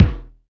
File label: DM5 Kick, Alesis DM5 drum machine